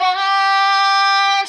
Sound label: vocal